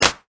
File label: 808 clap